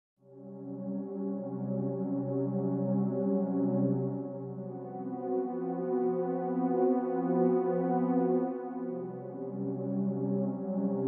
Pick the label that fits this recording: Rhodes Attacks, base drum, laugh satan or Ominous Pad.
Ominous Pad